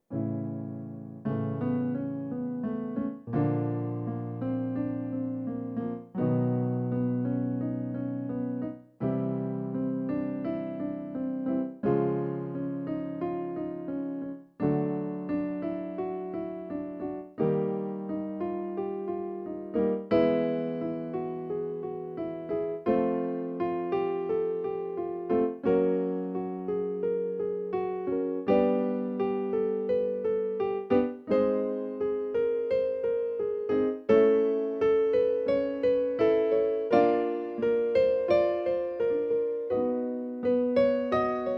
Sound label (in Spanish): Piano Intervalos